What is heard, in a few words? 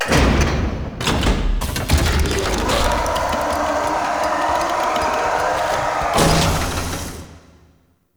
Reactor Unlock; Mod Quest; Warframe; The Teacher